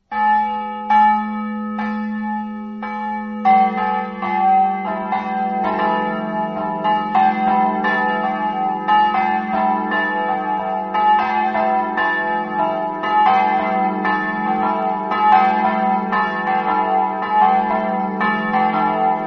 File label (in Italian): montefosca, campane